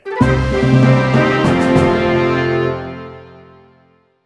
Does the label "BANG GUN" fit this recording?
No